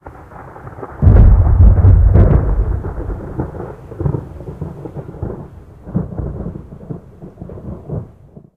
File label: thunder, thunderstorm; ambient, weather